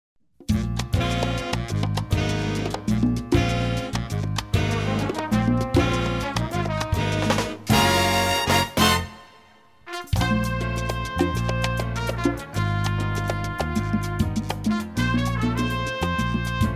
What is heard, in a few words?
Jazz Orchestra